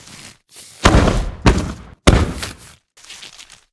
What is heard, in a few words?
Sling Pea Plant Food